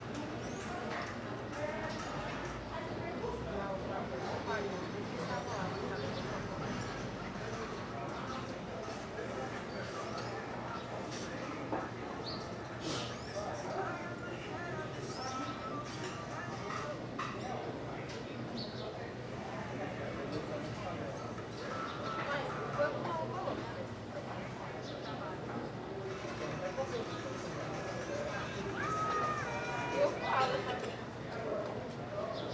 Vozerio mercado central goias velho de manha tv longe, refrigerador; multidao; utensilios

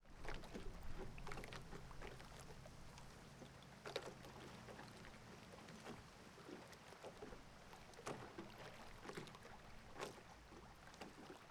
Agua batendo em canoa na beira do rio vento

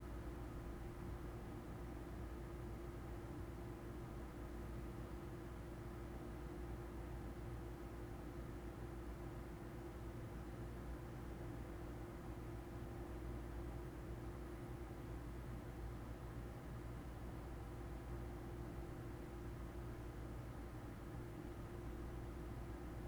Ambiencia sala de radio vazia com ar condicionado; maquinas; colecao sonora do cerrado

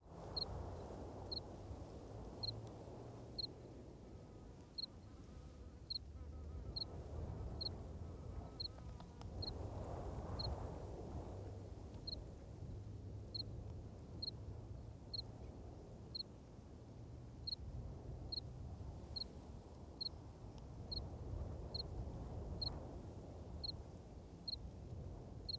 Vento Forte com Grilo bem Marcado

